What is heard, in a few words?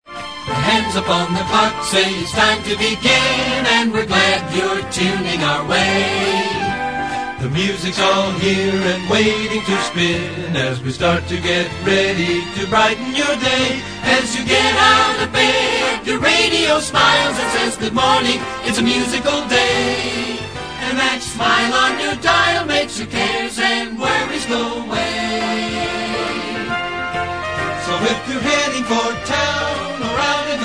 jingle